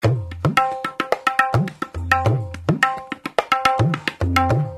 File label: TAB LOOP, beats